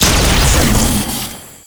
assault gun shoot crit, weapons; tf2classic

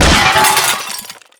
robo impact hard; tf2classic; mvm

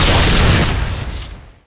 flare shoot crit; weapons; tf2classic

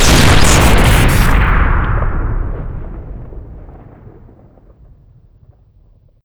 bofors shoot crit; tf2classic

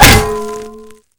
pan impact world; weapons; tf2classic

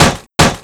reload, weapons; patriot; tf2classic

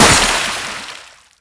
jar explode; tf2classic

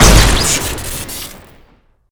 shotgun shoot crit; weapons; tf2classic

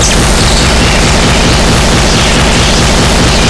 akcannon fire crit, weapons; tf2classic

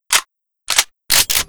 tommy world reload; tf2classic

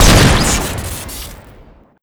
judgedeath shoot crit; tf2classic

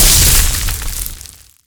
dragons fury impact bonus damage; tf2classic

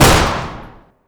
1911 shoot; tf2classic

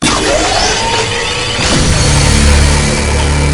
tokamak wind up; tf2classic

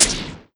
knife hit; weapons; tf2classic

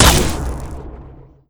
marked for death impact, samurai weapons; tf2classic

